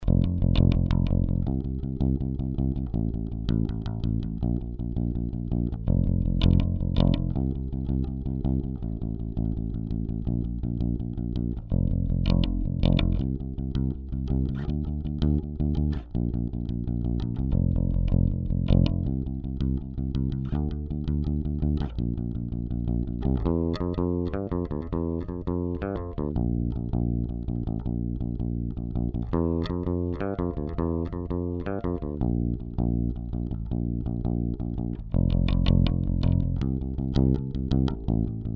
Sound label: bass line - driving